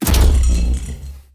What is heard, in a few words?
imp paper knockback